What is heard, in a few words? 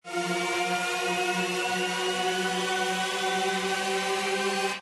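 respin Sound; jackhammer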